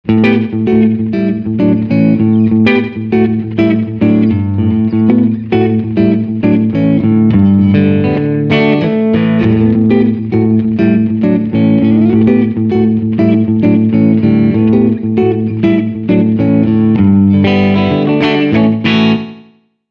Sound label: Blues Club